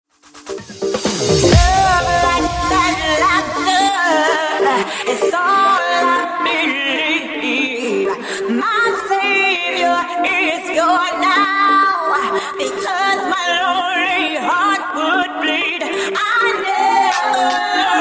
Disko Funk Remix, 127 Bpm

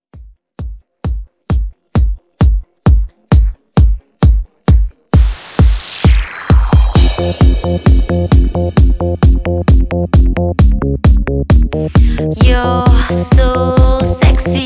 Sexy Redrum

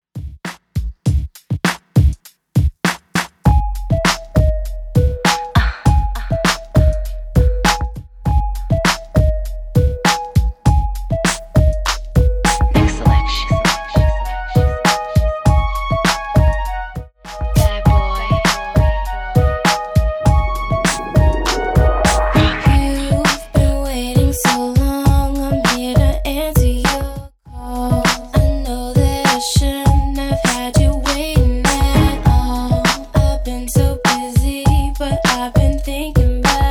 Throwback Rnb 100bpm

